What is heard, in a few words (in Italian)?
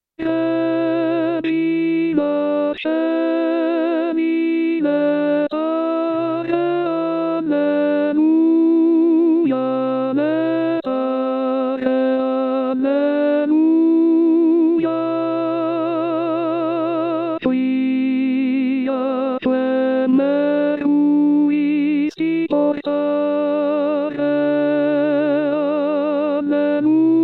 tenori; Regina Coeli; Lotti; pasqua